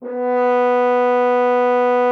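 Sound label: French horn H3; Xsample VOL-3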